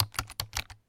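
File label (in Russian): fast movement of fingers on a keyboard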